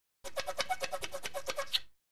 Billiards Chalking

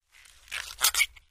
Celery Break Slight; gore stabs & eat

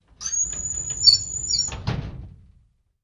Drawer Metal Close

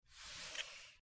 Short Squeaky Mouse

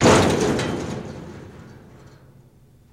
Jeep Crashes Metal, car crashes

